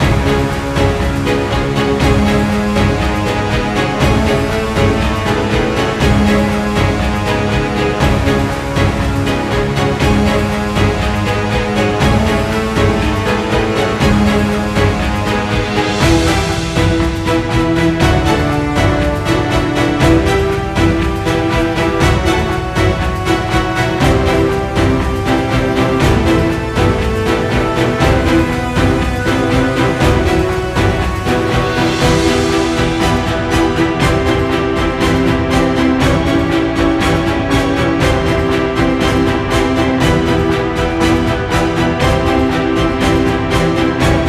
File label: tension; By Burak Sound Bank